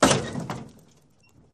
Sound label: Auto Crash Lrg Head, car crashes